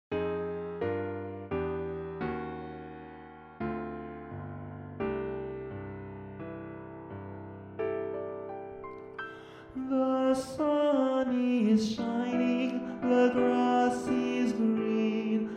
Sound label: contraltos